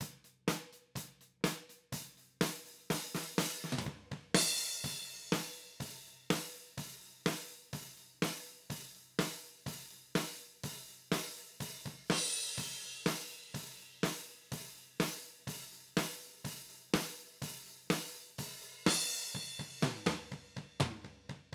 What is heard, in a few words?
TubeTech EQ OHs